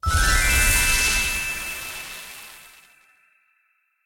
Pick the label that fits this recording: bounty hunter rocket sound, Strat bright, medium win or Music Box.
medium win